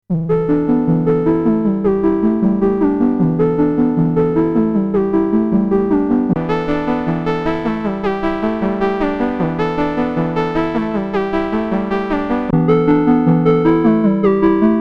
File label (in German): Gamechanger Audio Motor Synth MkII Analoge Wellenformen